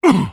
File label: pain; spock